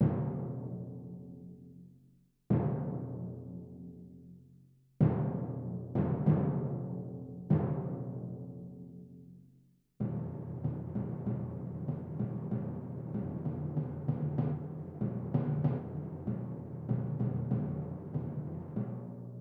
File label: Trio For Timpani